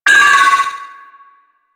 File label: Trooper Vocal Pain; Warframe; DE; Sentients